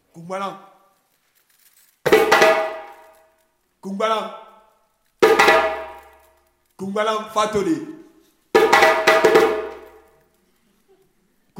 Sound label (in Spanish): IMITACION HABLA CON TAMBOR; musica; africa inspira a occidente